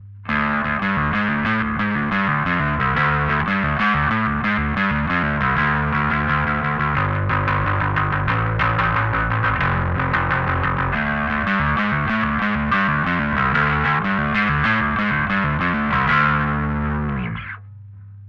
Distortion Midboost dry

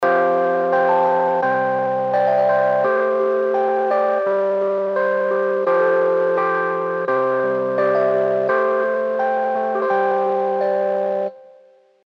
Tape, Crappy Radio, Stage 73 V2; Arturia; MELLO FI

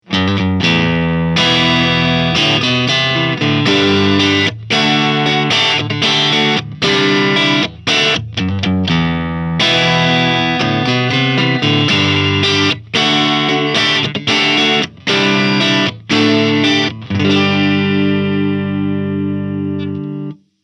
Single Coil Clean; Electro Harmonix MIG 50

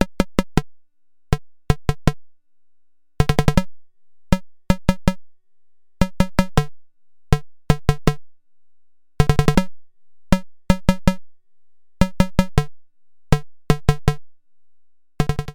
Pittsburgh Lifeforms SV 1 ADSR Snappy

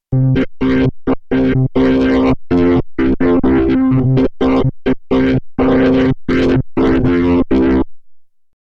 b4000 drive

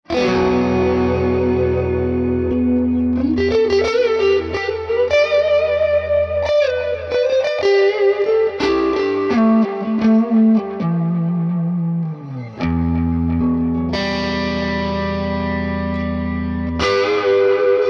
Morgan Amps Suite Clean Canyon Chords AC20

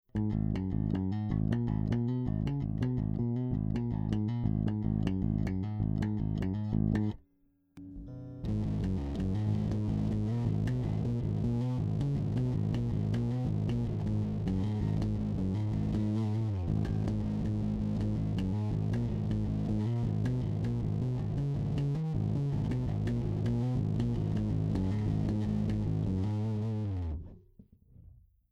Mean Drive Fingers; Fuzzmo Ltd

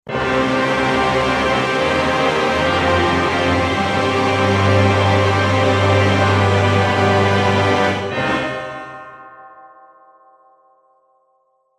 Orchestral Colors Big Orchestra Sustains; Armageddon

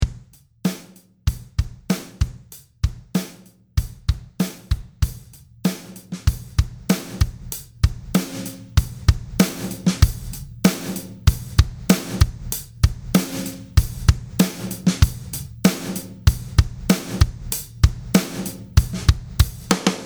Oxford Envolution more Transients more Sustain

